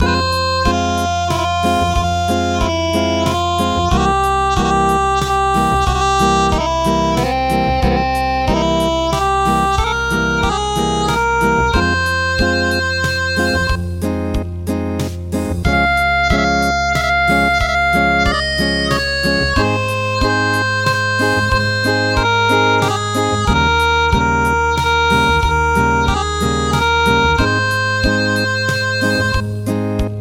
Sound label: Acoustic